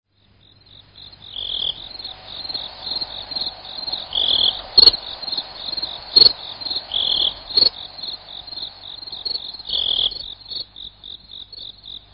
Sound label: crickets, midi